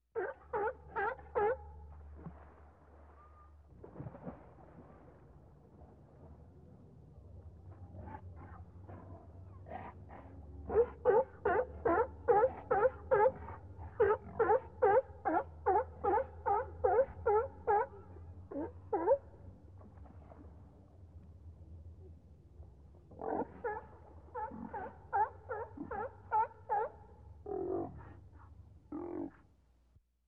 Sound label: Seal barking long